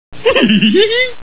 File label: hehe